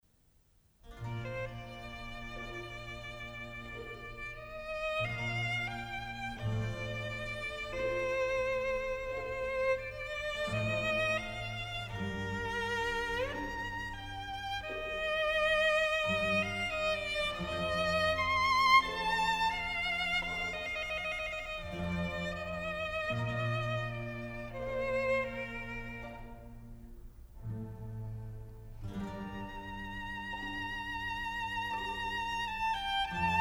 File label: Concerto in G minor, Violin Concertos; BWV 1056; Johann Sebastian Bach